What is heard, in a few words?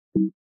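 mac os operating system sounds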